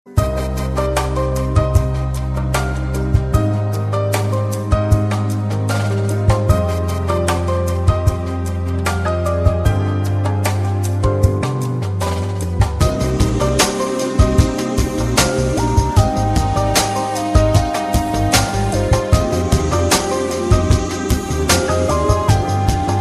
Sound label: nice tone